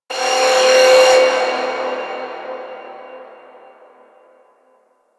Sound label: Fortress Alarm Texture; Ambience; Warframe; Grineer Fortress